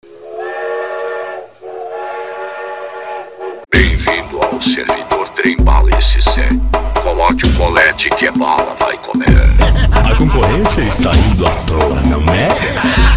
music; cstrike

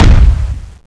boss footstep; Zombie Scenario

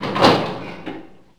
door metal open; left4dead; re doors

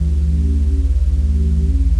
gunkata idle, weapons

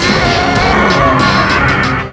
cell powerup; db mod 15

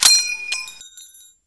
pin grenade, weapons

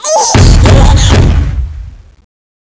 zombi bomb crazy; cstrike; CSO; LightZombie

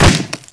kick wood; cstrike; ZombieDarkness

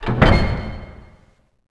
door open; left4dead; re doors; rpd

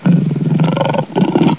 nemesis pain, zombie; zombie plague

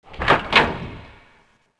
door wood open; left4dead; re doors

